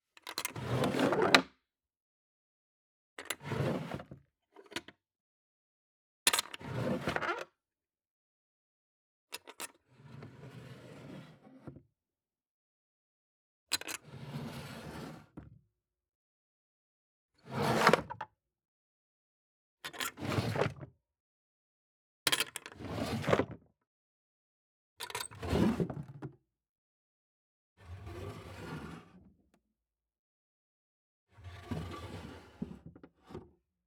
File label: Nightstand Old Drawers Wood Open x11; Soundopolis; Household Doors HD